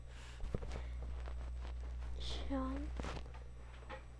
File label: shut